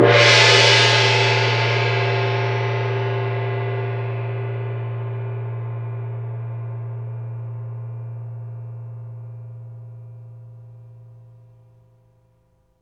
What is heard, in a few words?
CYM MED, cymbals; Roland LA; CYMBALS TAMS